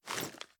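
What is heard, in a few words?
pistol reload mags raise; gmod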